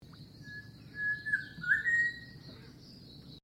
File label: Bird Unknown Magroves Call, Birds from StKilda Magroves in South Australia; Animals